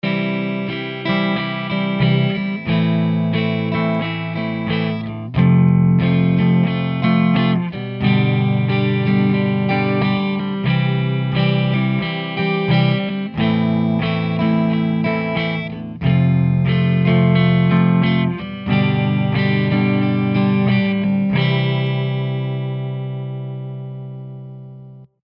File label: rock guitar